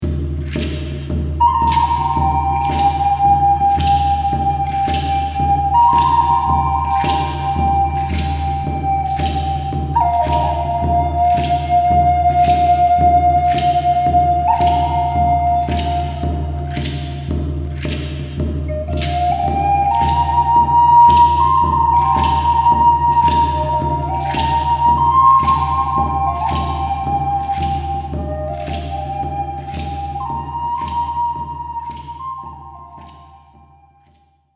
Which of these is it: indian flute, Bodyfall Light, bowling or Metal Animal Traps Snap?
indian flute